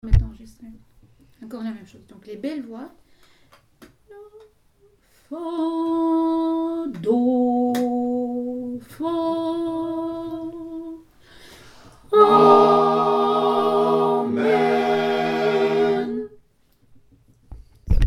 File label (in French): Amen ensemble